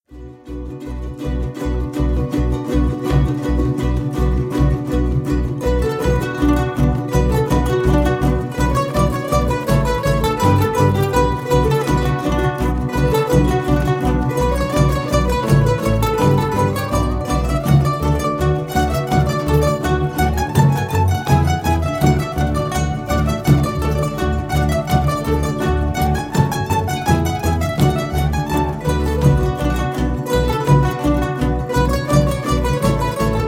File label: Ukes